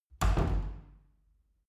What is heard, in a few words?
wood door old reverberant soft